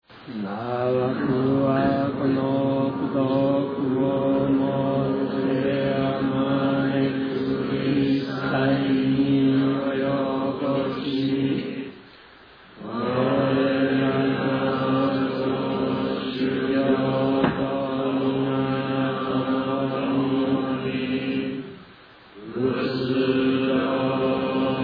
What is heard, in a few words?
end chant